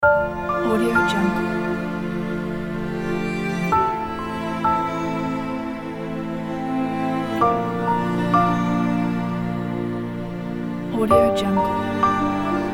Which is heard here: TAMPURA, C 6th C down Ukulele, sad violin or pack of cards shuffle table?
sad violin